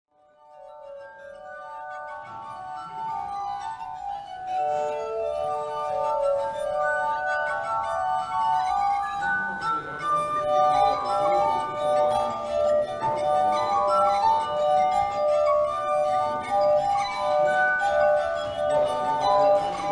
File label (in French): musique, instruments insolites